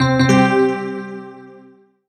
Solo EPiano Positive; MUSIC EFFECT; startide

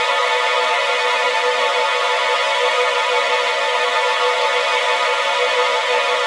Violins Trill; gamerip; shu; ps vita ps4 switch windows

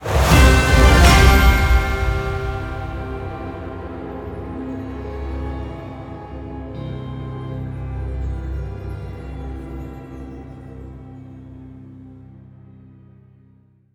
victory; Iron Marines

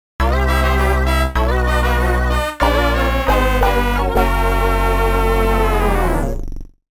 Finished 5th-8th; Mario Kart DS

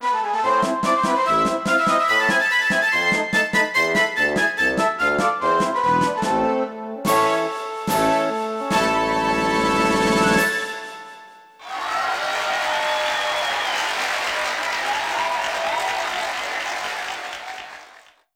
BGM Club Success; tokimeki memorial girls side 4th heart; Scenario

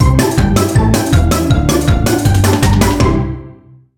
End Tag, music; Spanish; Paperbag

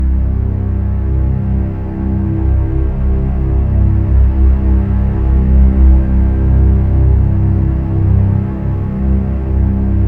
Drone Ominous Moody

